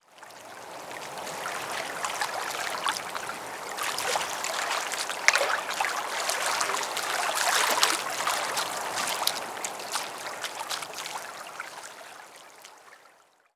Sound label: environment water quiet docks